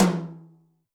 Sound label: tom, Zoom MRT-3B